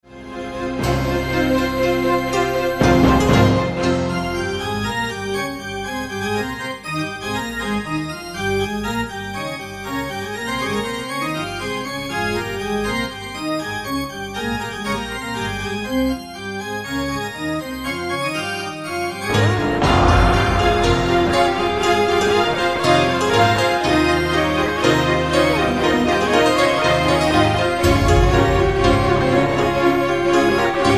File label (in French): synthe extrait; un autre extrait du morceau avec une programmation d'orgue et l'incursion d'une guitare électrique